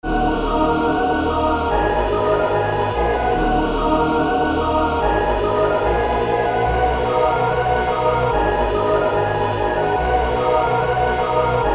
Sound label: D min 145 BPM Vocals